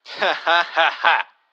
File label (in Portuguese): Riso forçado